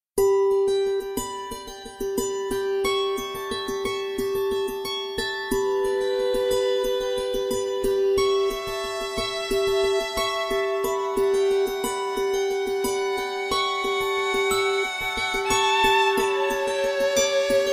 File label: Balaphon; Doov A8